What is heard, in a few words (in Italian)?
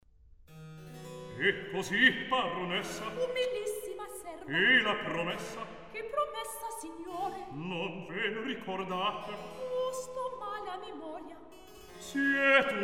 Recitativo; E cosi Baronessa; Mozart; La Finta Semplice